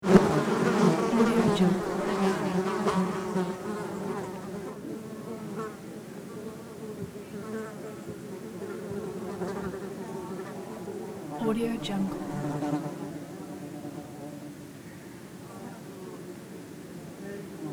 flies fly buzz near

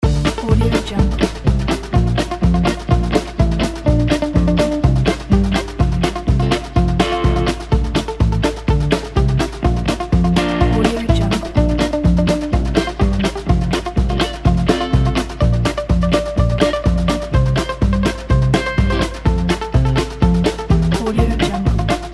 country happy

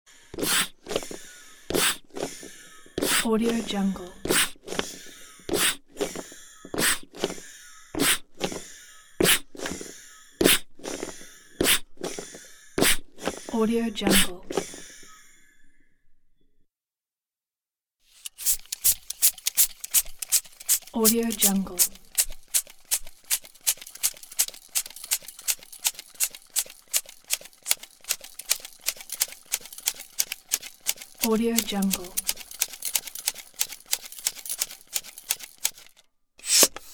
air pump sound pack; audiomonkery

